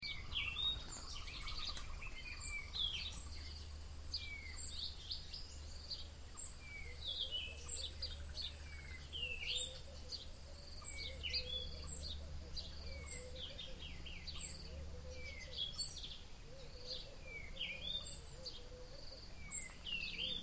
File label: roodekopjes birds